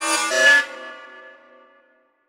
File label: Transition Metallic Warble